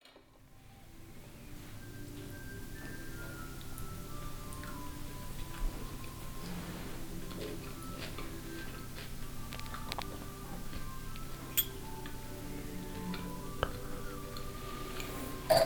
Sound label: breakfast myvatn